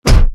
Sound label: Robotic Footstep; SoundsCrate